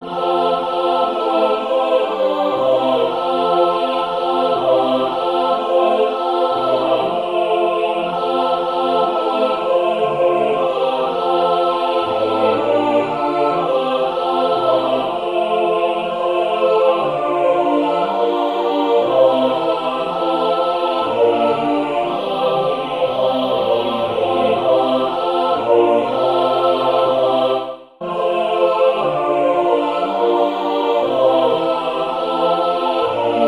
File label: midi